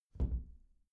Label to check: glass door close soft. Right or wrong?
Right